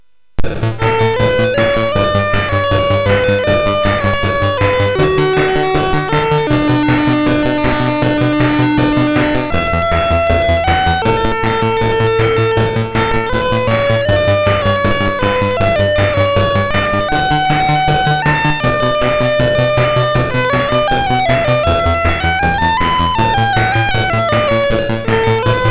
Synth Sample, MOS6581R3; hvsc

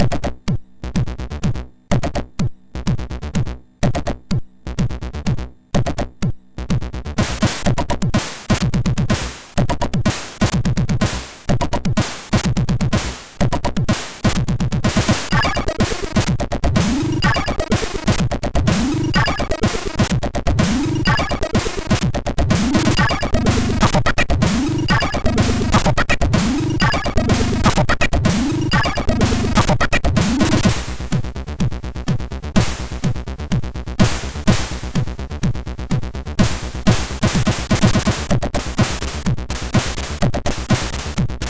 sid, MOS6581; hvsc

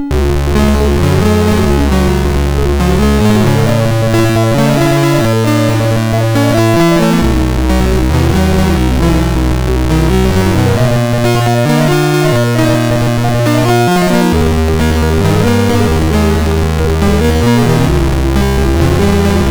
Randomly generated music BASIC, sid MOS6581R2

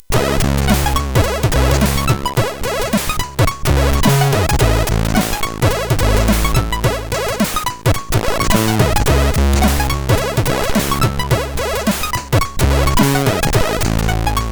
Drumz, sid CSG8580R5; Morton Adam

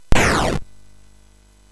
Frantic, MOS6581R4

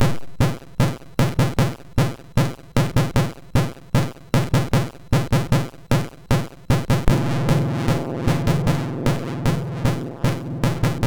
sid, MOS6581R2

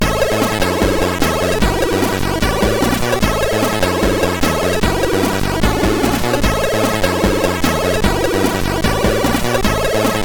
Unreleased Song, CSG8580R5

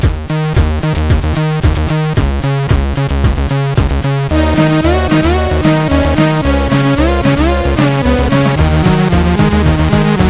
sid 8580; Hyper Cars

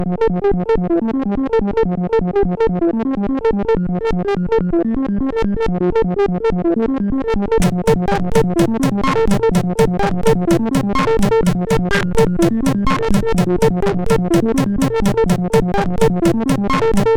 3 Note, MOS6581R4; IMAP

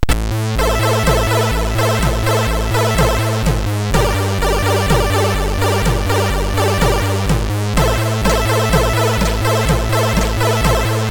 intro sid MOS6581R3; Monthly News 6

